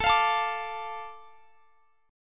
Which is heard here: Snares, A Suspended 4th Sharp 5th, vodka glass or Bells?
A Suspended 4th Sharp 5th